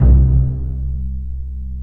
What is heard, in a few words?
Bass Piz A C1; Digital Sound Factory Virtuoso